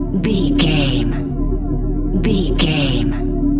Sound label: sci-fi force field hum loop